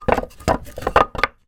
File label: foley wood blocks small movement; zapsplat